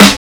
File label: Snare, Drum and Bass